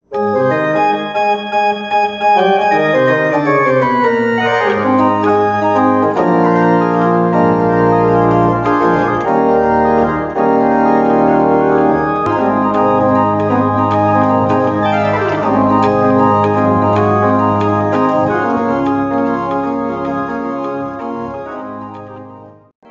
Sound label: Jazz Pop